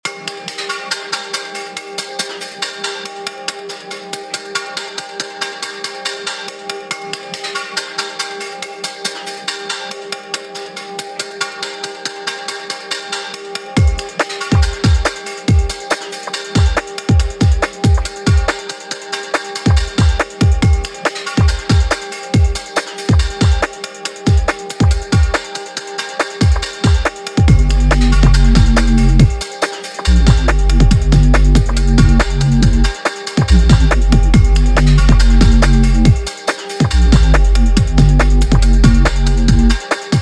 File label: beat